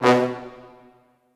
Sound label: B1 R, Orchestral Brass